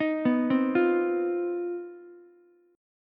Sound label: C Minor Major 7th Double Flat 5th C down Ukulele; arpeggiated; JGuitar